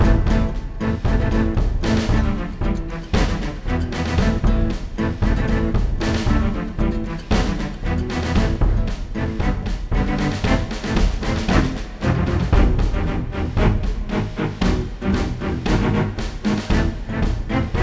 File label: Duel Music Bad